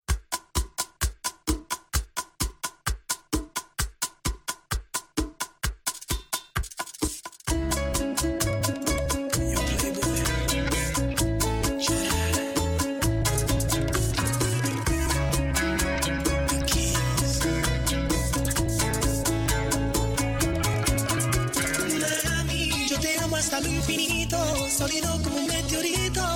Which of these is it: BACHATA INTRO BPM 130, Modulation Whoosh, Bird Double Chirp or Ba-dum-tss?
BACHATA INTRO BPM 130